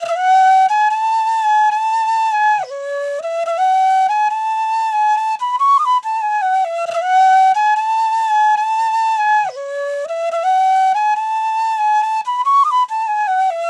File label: Drill Flute Loop BPM 140 F Min; Gully Loops; flute melody bundle